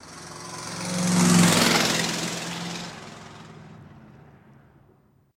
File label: Car Fast By, Sputtery Engine